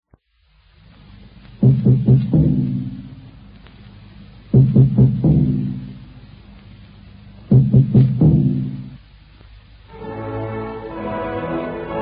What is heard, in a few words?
radio news bbc european service interval signal